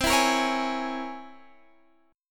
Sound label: C 7th Sharp 5th Flat 9th C